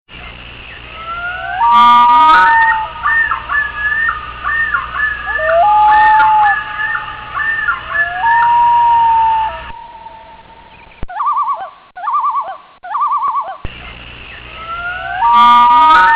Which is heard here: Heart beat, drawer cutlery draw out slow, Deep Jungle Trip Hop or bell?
Deep Jungle Trip Hop